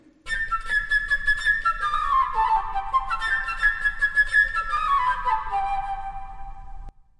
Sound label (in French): flute oiseau